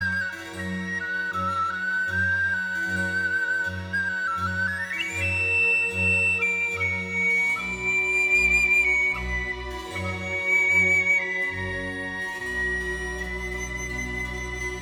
Flautino Concerto in C Major; Vivaldi

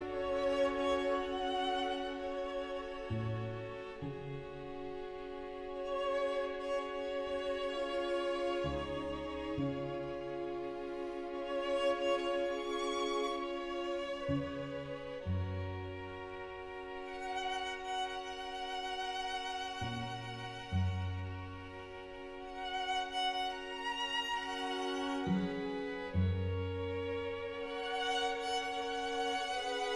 Adagio (string quartet); Schubert

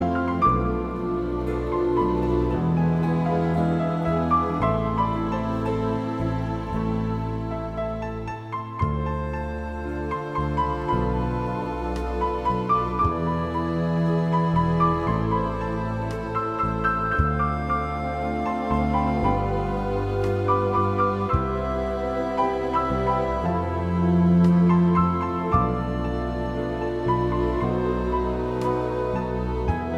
Song for piano

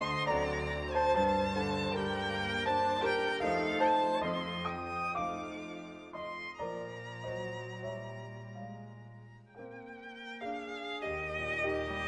Salon Orchestra

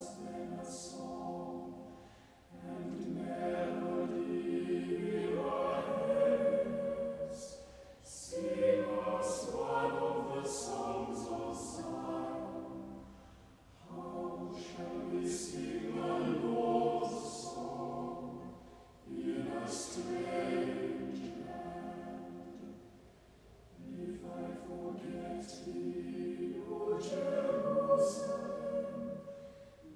Choir; gemengd

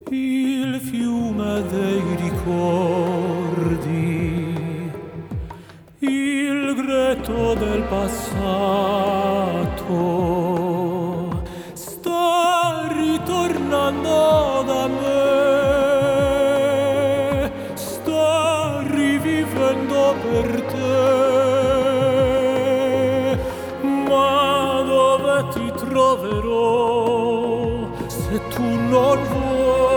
Tenors - Adagio

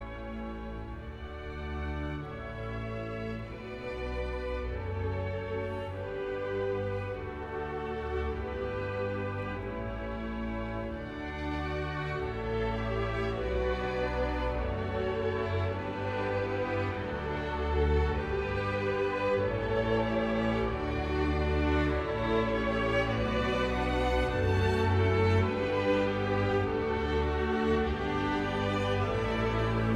Canon; Pachelbel

